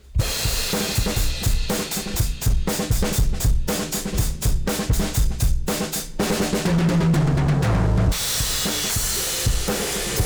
Bus Processor THRESHOLD 25.0 dB MAKE UP GAIN 8.00 dB SATURATION 10.00 PRE COMP